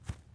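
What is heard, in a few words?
fluffy walk, footsteps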